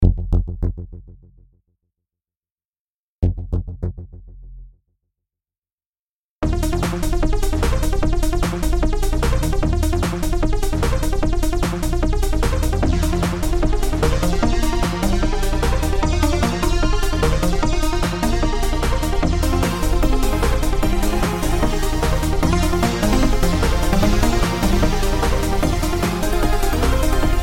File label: Trance, music; JJ2